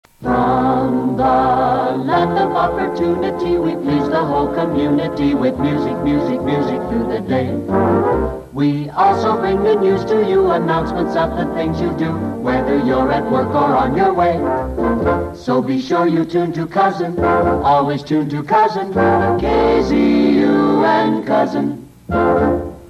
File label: JINGLE; KZUN